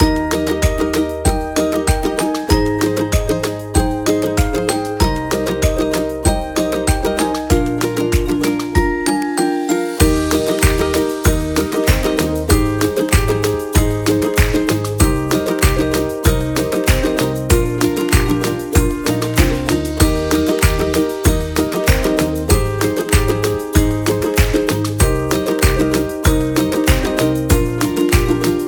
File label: Kids Music Bpm 96 Loop